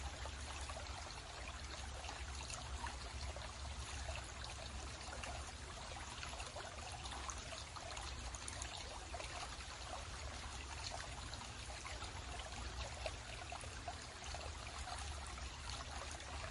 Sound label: stream